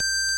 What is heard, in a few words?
ORG VOX G, Continental; Roland LCDP09 Keys of the 60s and 70s 1